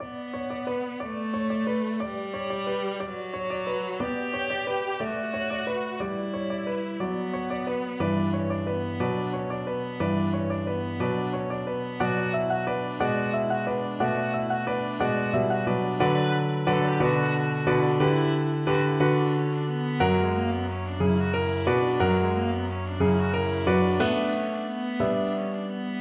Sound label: viola